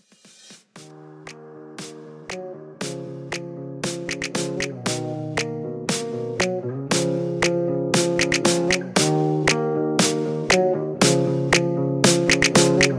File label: Blend Clap 117 Bpm